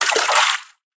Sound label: watersplash; Minetest; VoxeLibre; fishing